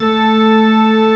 elec org; M8; CMI Factory

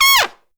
TRUMPET FX, Pop & Funk Brass; Best Service ProSamples vol.25; AKAI

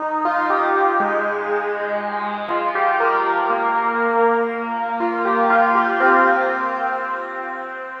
Emotion Sines, Synth Textures; Sonic Foundry (Sony Creative Software); The Electronic Music Manuscript Collection

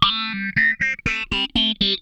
FAZE PLUK, Funk Guitar; 90 s Sample CDs; Zero G

